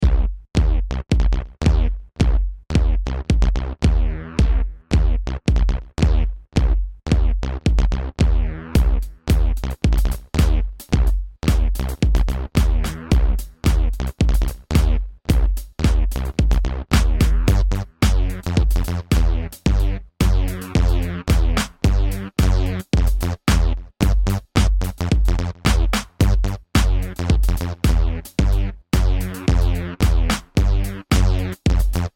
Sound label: bass lead